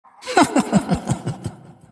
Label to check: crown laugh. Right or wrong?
Right